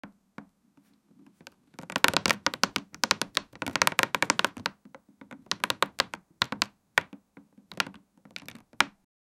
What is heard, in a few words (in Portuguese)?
faa som de madeira quebra; break